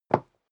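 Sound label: step leather shoes wood parquet single stair up, footsteps; schritte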